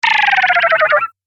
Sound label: Arcade Game Fast Beeps; Video Game Sound Effects; Retro Inspired; Nintendo, Atari, C64